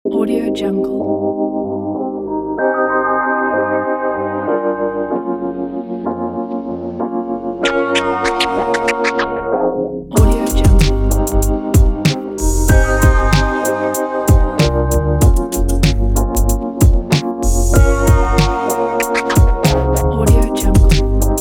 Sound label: hip hop lounge; redsevensound